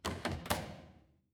door close

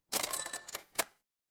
office cash register

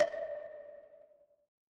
instrument wood block

